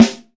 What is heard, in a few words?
boom snare sample a sharp key, snare drum sample tuned to the A# note